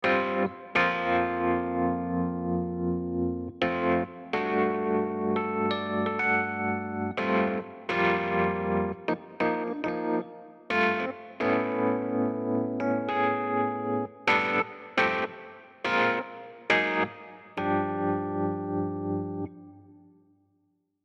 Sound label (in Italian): stacchi free jam